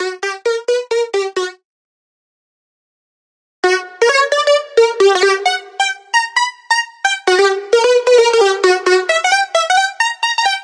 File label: synth